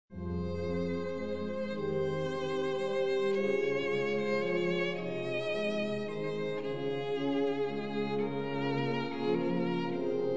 romance violin

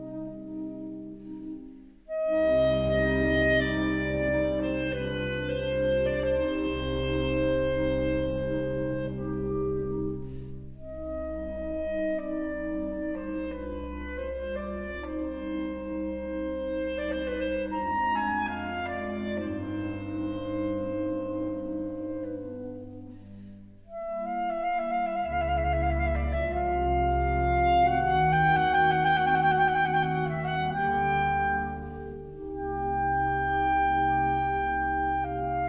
Concertino for Clarinet; Weber

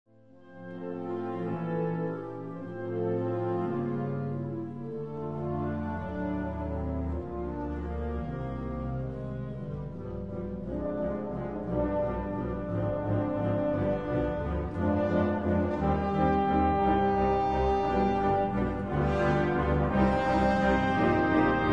funebre

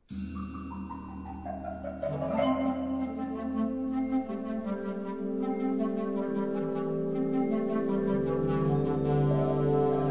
Chinese concerto

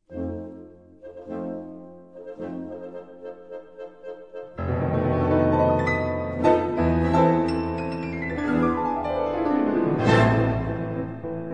piano concert; Grieg

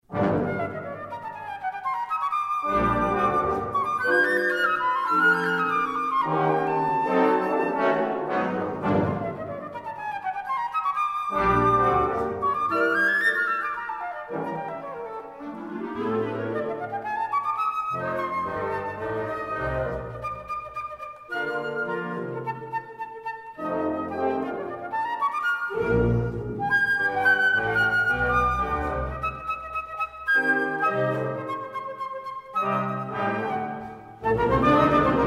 Tango Loco

